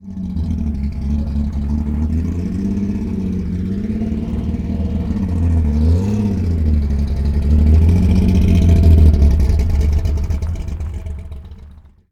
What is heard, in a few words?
GTR XU1 Torana